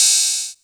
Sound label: Hihat open